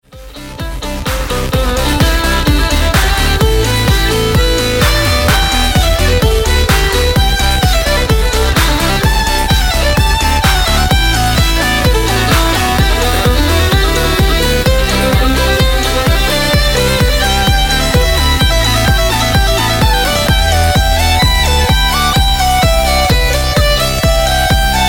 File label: Moon Trance, 纯音乐